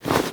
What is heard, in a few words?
Holster Weap; Foley; Warframe; Characters; Tenno